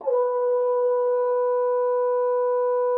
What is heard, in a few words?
FR HORN H4; Xsample VOL-3